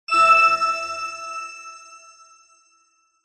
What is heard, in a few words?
multimedia game sound ping magical